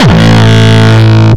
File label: Longkick, kicks